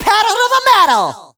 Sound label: Pedal